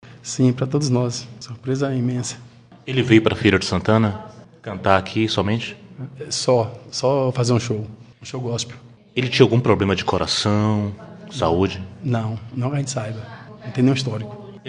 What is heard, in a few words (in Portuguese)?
cantor gospel